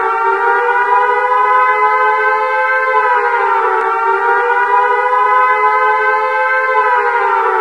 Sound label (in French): sirene